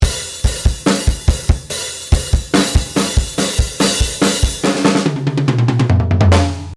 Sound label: MINI FILTER DRIVE